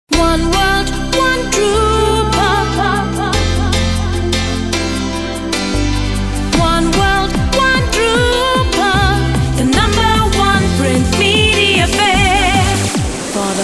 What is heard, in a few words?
Song; drupa 2008